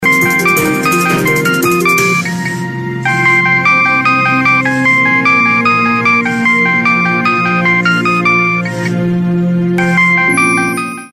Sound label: cellphone ringtone; Sunhani si ek Ladki Suhani